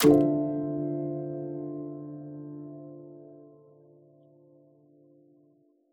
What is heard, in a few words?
UI Menu Press Apply; Blue Sheep